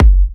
F# kicks; markus hakala kicks

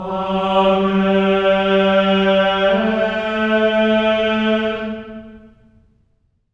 amen; Spectrasonics; Symphony of Voices; GR AMENS

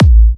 Kicks A; markus hakala

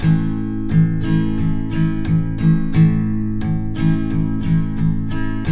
TK MJ A#2, Guitars; Spectrasonics; Hans Zimmer Guitars VOL-1

